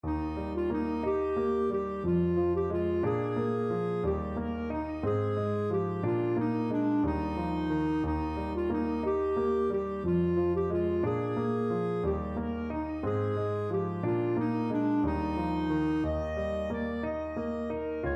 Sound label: lilli burlero, clarinet